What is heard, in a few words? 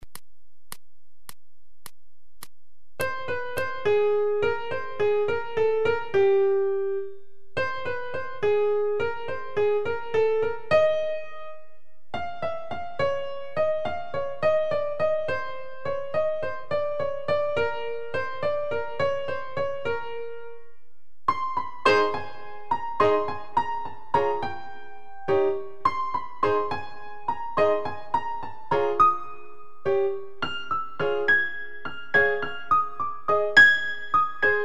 Ragtime 105